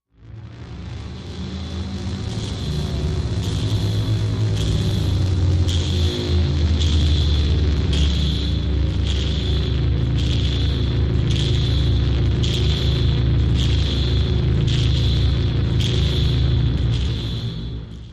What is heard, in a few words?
Suspended Weight Mach, sci fi ambience